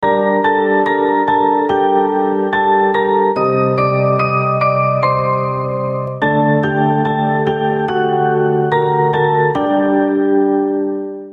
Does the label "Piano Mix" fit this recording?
Yes